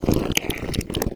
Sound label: imp chatter, monsters; Doom